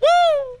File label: "woo", male; ooh ahh; ho hoo woo